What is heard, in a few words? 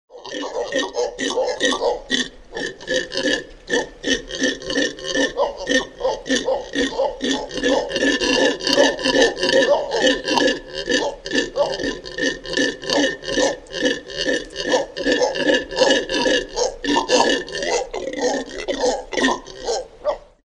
laughing group Monkey Voice